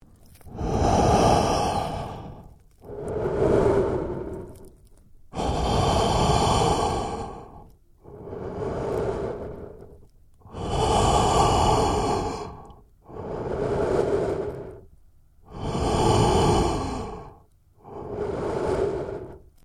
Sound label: MALE HEAVY BREATHING SEQUENCE COVERED LOOP; male vocalisations; Sound Effects:Human